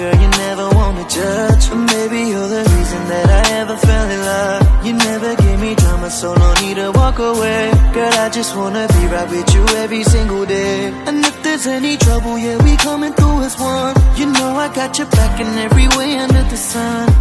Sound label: new status romantic ringtone, bollywood ringtones